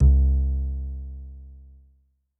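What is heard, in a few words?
Acoustic Bass, Yamaha DTXpress-II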